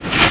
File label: Shuffle; TR3